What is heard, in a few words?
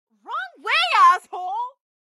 car reaction; Blind Drive